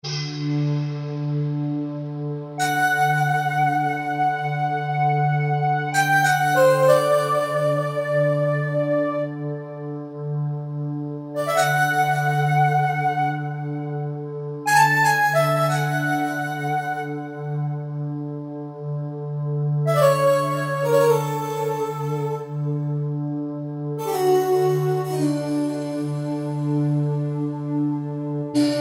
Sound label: Hybrid Breath, Kurzweil K2000; Kurzweil K2000 Contemporary ROM